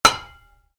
Wood hit metal ring, Wood, Light Impact: Metal, Rings Out; Materials; Stuart Duffield